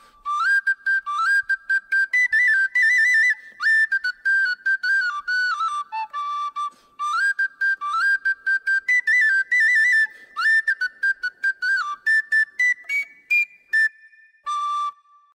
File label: sopilka